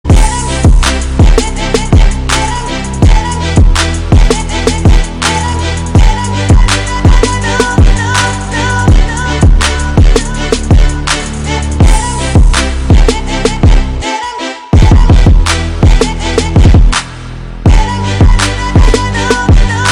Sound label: Ultra Hip Hop